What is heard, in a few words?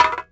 bouncy aluminum can hit; bouncy objects; Tony Hawk's Project 8; XBOX